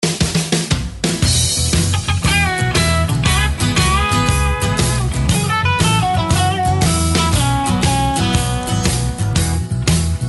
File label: country track